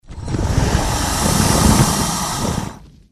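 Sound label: attack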